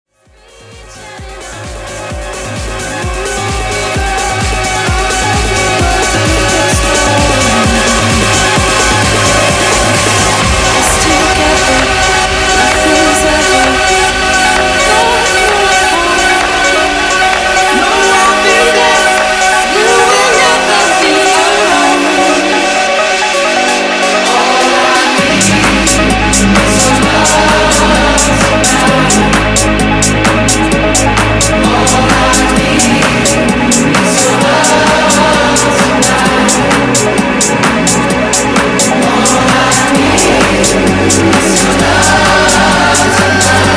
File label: Deep House 130 Bpm